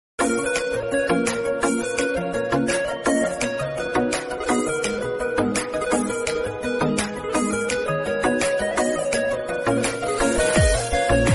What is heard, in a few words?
music